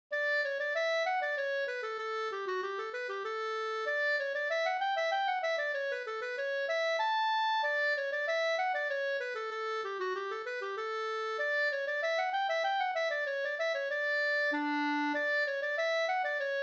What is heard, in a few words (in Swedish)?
slangpolska